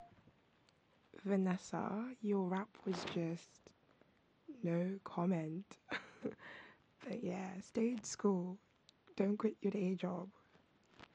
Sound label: rap